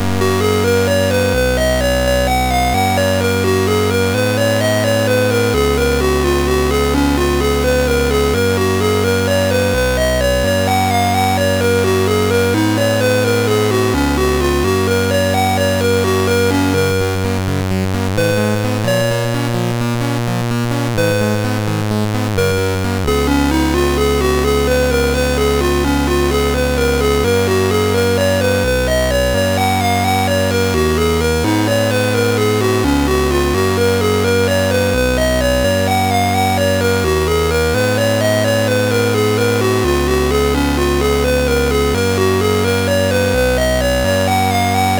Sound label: Digi Hits, MOS6581R3